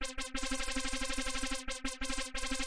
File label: 180 synth-roller, synth and electronic loops